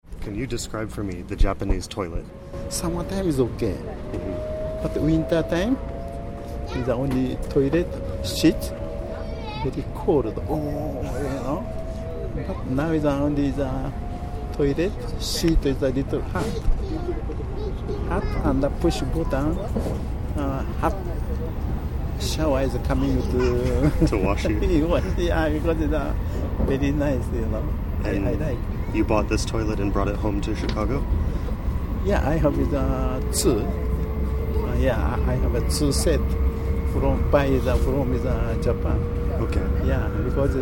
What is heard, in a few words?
Japanese toilet; 10 Days in Japan